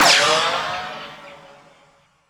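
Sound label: ricochet; gmod; viper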